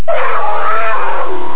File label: monster; AHHH!